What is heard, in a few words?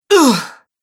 Female Fighter Grunts; Grunt Sound Effects